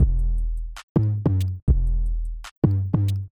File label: loop beat bass 808 Hard glued